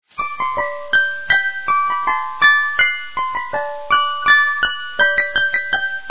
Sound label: magic flute; Reuge